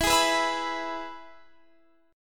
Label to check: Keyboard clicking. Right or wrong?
Wrong